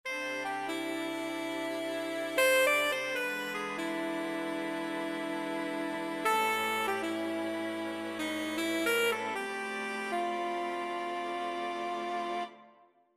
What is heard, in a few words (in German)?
statisch Streicher voll Tensions